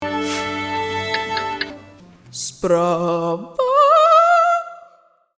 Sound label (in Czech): zpev